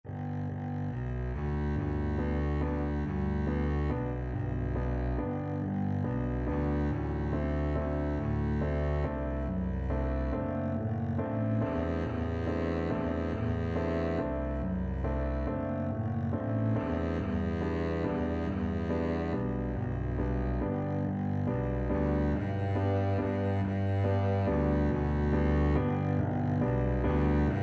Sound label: blue danube, Double Bass